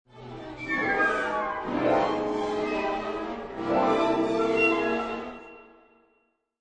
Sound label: Orch Mys